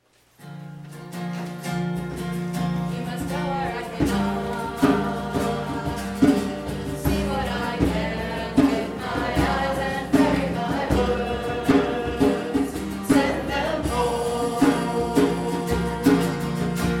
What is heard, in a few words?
chant